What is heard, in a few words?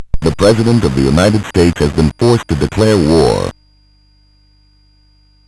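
Ocean Ranger, MOS 8580 SID chip music, games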